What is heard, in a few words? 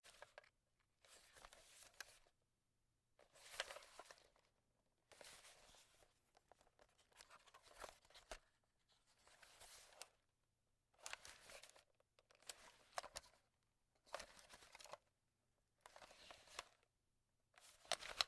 handling a camera